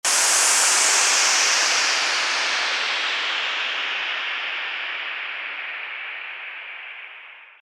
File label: Downlifter Trance